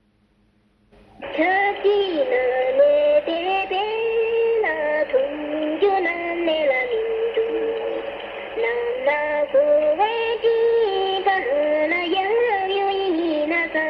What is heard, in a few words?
song